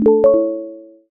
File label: Question Prompt; Emberfall